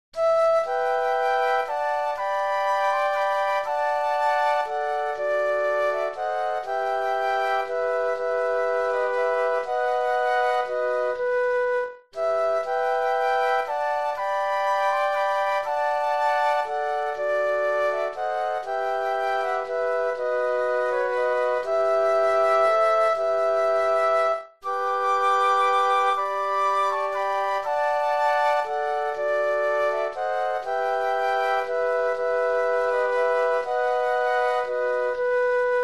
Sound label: Flute Quartet; christmas